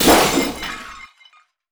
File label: glass breaking; anemoi